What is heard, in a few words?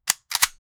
pistol reloading; weaponry